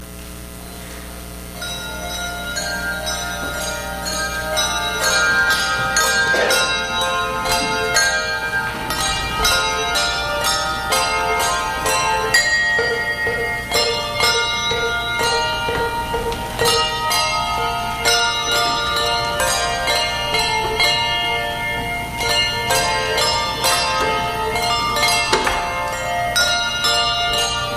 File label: Youth Handbells